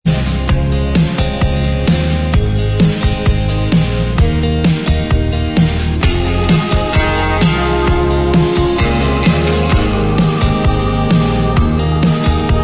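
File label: instrumental, backing track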